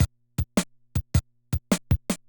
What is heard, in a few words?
Loop, classic machines, rpm 40